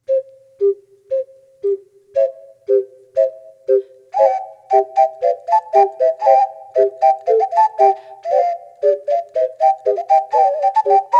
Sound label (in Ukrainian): ocarini